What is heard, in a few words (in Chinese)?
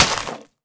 沾泥的 红树根 破坏声